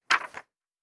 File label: Book Page; Fantasy Sounds Bundle